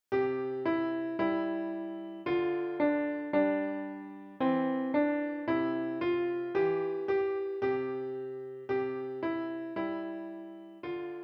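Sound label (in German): Klavier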